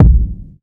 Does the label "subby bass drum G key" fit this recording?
Yes